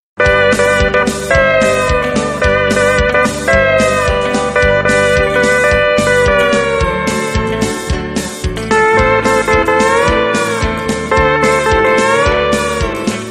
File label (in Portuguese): guitarra